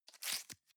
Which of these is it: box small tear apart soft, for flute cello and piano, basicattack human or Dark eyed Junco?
box small tear apart soft